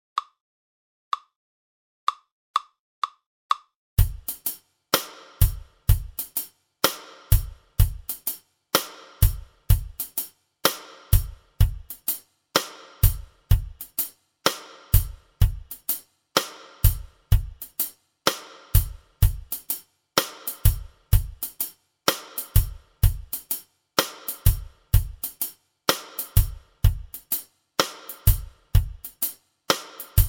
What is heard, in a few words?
Batterie Reggae; Batterie Reggae VIbrations (Buchmann)